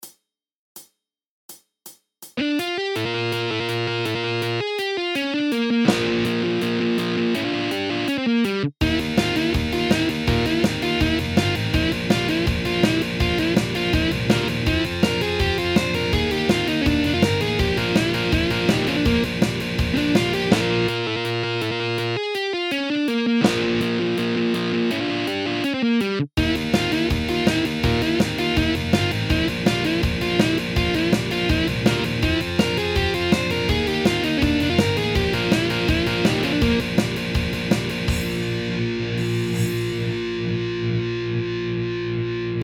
rock solo, guitar; teiban

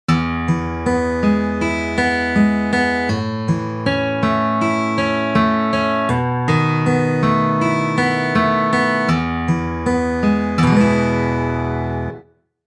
finger-picking, guitar

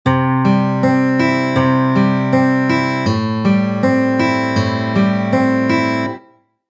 finger-picking, guitar

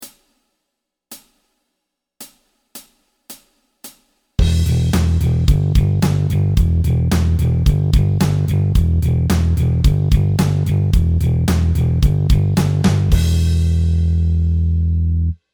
first phrase

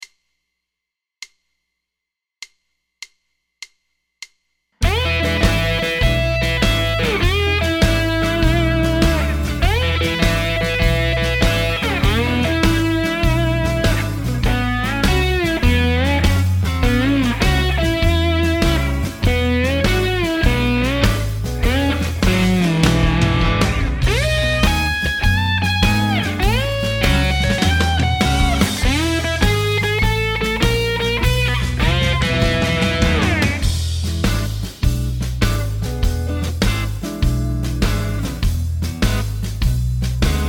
bottle, guitar

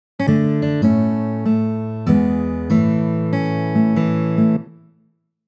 happy birthday, guitar

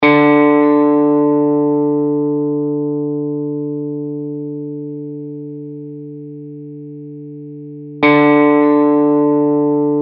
tune 4D

